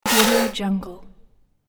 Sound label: robot sound metallic turn